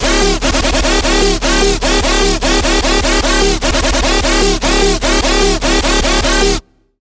Bass - Propeller, Serum 2; Routed Sound; Serum 2 Expansion - Chaos Bringer Vol.1

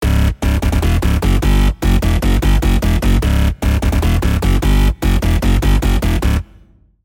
Bass; Routed Sound; Spire Expansion - Chaos Bringer Vol.1

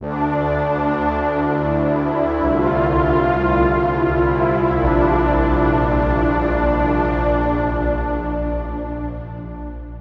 Pad; Routed Sound; Spire Expansion - Reality Maker Vol.1

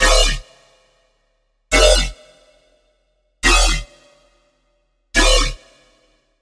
Growl - Robot, Serum 2; Growl - Robot Switch; Routed Sound; Serum 2 Expansion - Chaos Bringer Vol.1

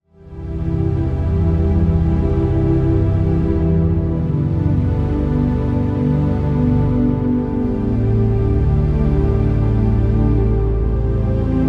Pad; Routed Sound; Sylenth1 Expansion - Flame Summoner Vol.1

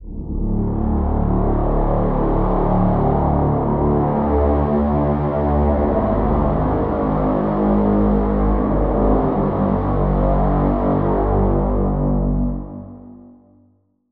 Pad; Icebound Fortitude; Routed Sound; Serum 2 Expansion - Doom Carpenter Vol.1

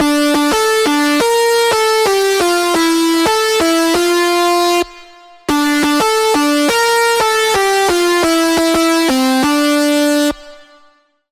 Lead; Routed Sound; Spire Expansion - Flame Summoner Vol.1

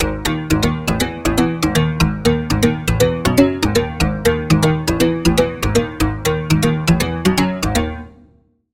Pluck; Routed Sound; Serum 2 Expansion - Noble Gangster Vol.1

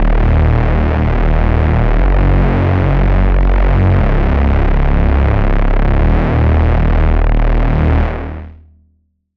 Reese

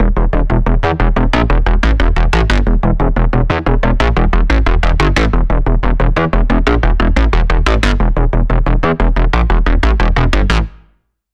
Pulse; Routed Sound; Sylenth1 Expansion - Quantum Enforcer Vol.1

